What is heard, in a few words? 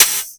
EFEX, FX DRUM